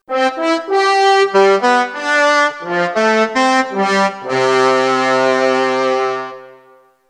Trombone Ensemble

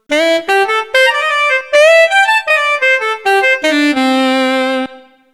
Alto Sax

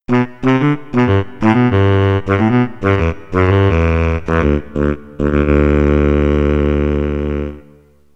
Soft Baritone Sax

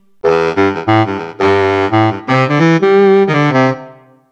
Baritone Sax

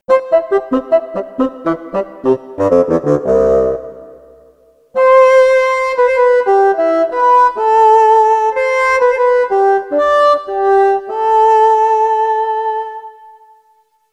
Classical Bassoon

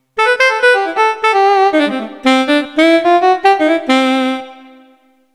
Soft Alto Sax

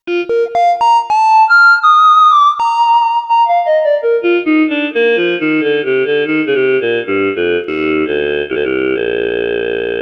Vowel Synth

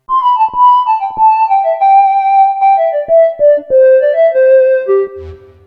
Classic Triangle

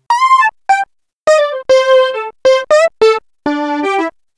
Happy Organ Wide

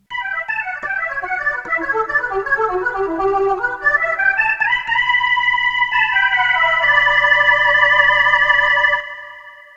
B3 Classic

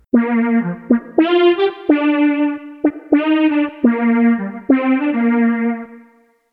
Dual Quack

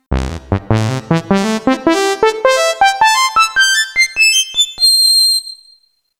Saw Growl

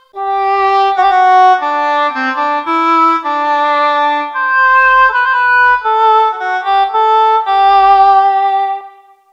Classical English Horn

